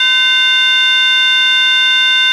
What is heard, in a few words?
chime